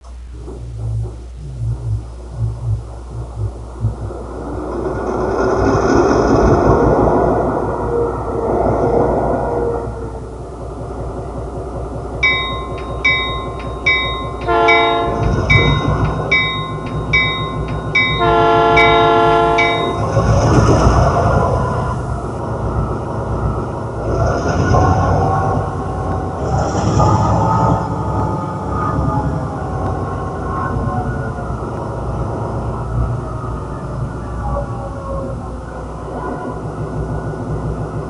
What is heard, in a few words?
Diesel Sound; Diesel Sound Demo; RailPro